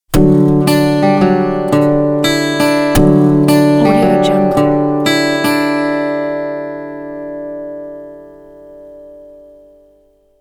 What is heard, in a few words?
opening guitar logo